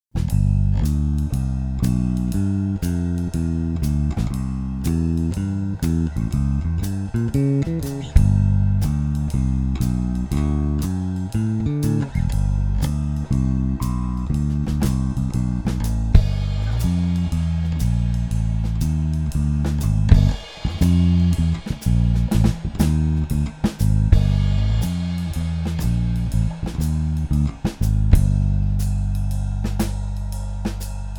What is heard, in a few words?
Jazz Mix, basses; Hybrid 5